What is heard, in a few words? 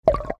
liquid event